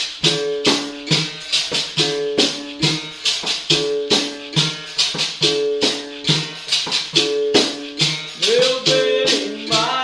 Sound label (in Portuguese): CORO